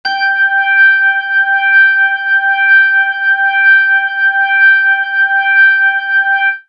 Synth; AMG

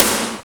CRACK SNARE; Drums In Your FACE!

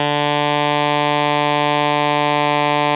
ALTO MF D 2, saxophones; Greytsounds - Philip Wolfe David Crozier's Saxophones; ALTO SWITCH

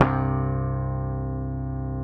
C, Bass; Sampleheads; Will Lee Bass Library

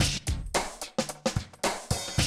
Classic DrumLoops, 110

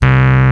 RHODES C2; E-MU Formula 4000 Series Vol. 3 – Analog Odyssey; Vintage Keys

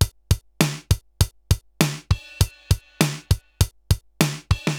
RX17 (100BPM), cyborg beats; musicradar

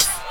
HH, Dance Mega Drums; Best Service; HH-HIP

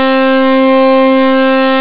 Synth Axe Lead; rare gems

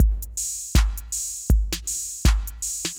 Synth Loop, BPM 80

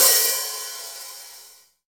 hi-hat real; Hi-Hat menus

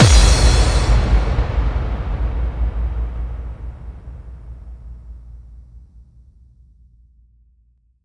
FX Kicks

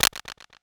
DUB CLAP; E-MU Formula 4000 Series Vol. 3; Analog Odyssey; Electro Perc

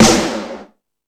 Lazer Drums, E-MU Pro-Cussion; drum machine

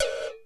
PERC GATED; Crypton; DrumBase5000

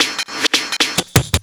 LOOP SD, 168 BPM, Drum & Bass; Ueberschall; Drum & Bass Flash Trackz

